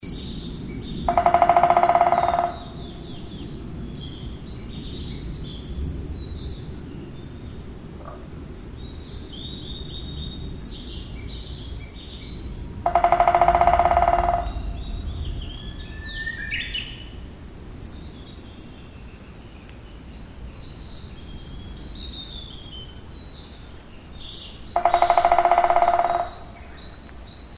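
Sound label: drumming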